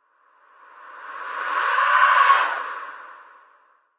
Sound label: War Sug; red cross eru